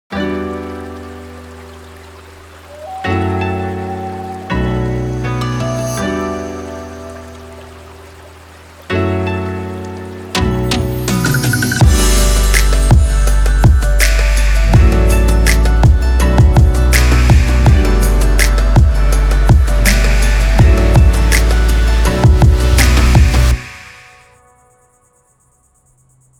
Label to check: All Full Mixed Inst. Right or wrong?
Right